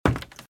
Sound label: Footsteps - Wood - Creaky - Run - Right